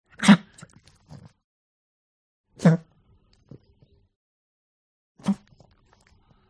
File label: DOG Pug Gag Smack Medium; ANIMAL; smartsound